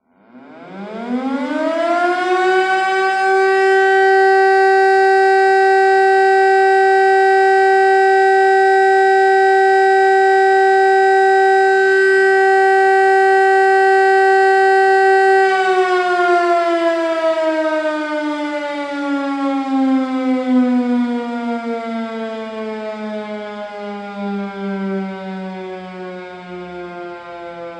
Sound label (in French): sirene